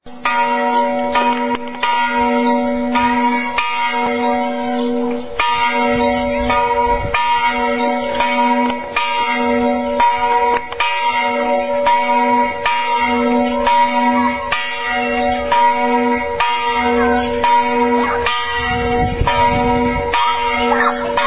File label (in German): kirchenglocken